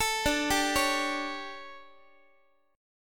D Major 7th Suspended 4th, Guitar

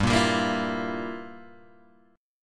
G Minor 13th G down Guitar Open G

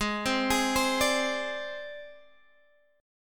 C Suspended 2nd Sharp 5th

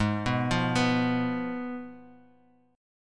F Diminished, chord

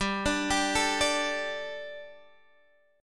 G Suspended 2nd G, down, Guitar, Half Step Down

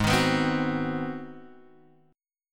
Ab 7th Sharp 9th Guitar Open G, chord

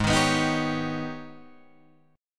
G sharp Minor Major 11th, Guitar Drop D

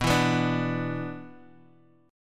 B-Minor Double Flat 5th B down Guitar Open F; Bmbb5 strummed; JGuitar

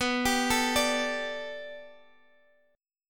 B-Minor 7th Sharp 5th B down Guitar Open G

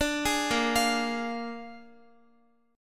Bb 7th Bb down Guitar 4E,4A,5D,5G,5C,6F; Bb7 arpeggiated

